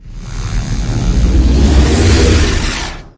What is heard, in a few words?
Vehicles Generic; unreal development kit